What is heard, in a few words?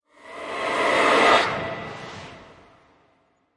Cres Ascent; Brand X Music; Brand X Music Catalogue - Editor's Essentials